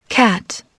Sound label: cat